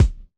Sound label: kick, Roland MC-909